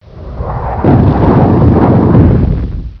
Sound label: Heavy Bombing of Troops; Onslaught